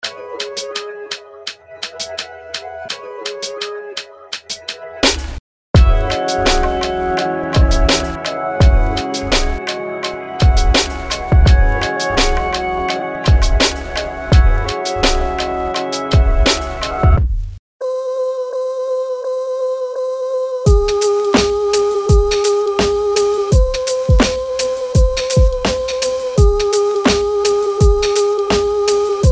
eclectic beats

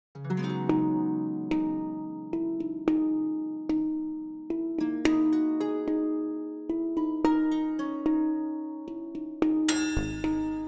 acoustic curiosity

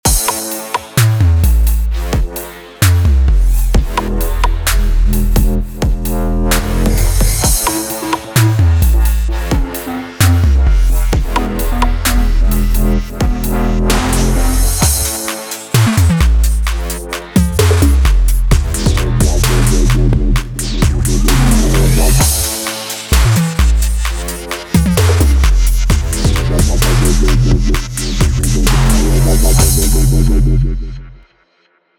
bass trap; bass master

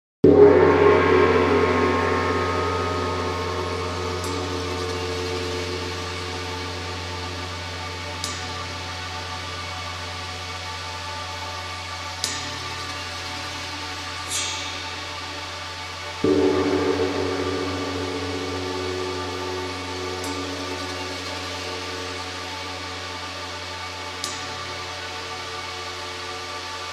the gong

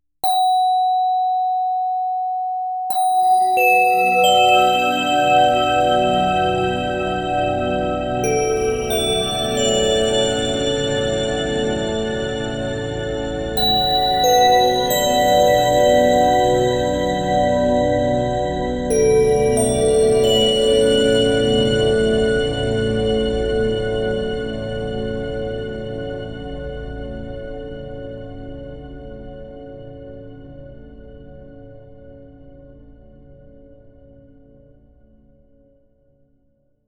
metallic bell; luminance

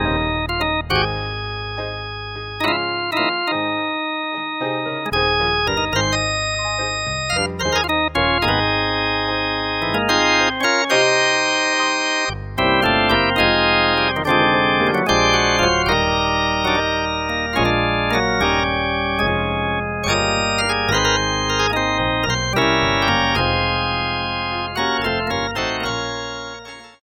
gospel soul c3

